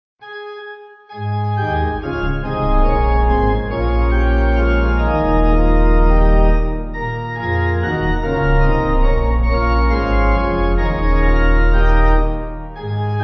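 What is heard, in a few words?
Kyrie, Pipe, hymn backing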